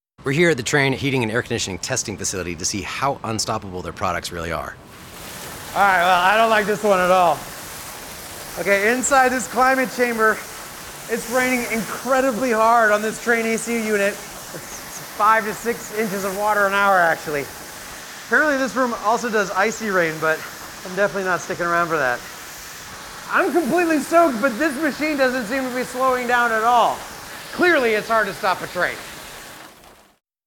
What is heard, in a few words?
rain radio